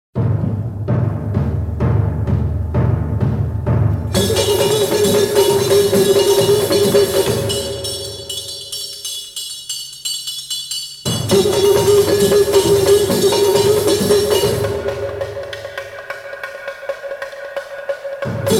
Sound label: Samba Quente, Batucada & Capoeira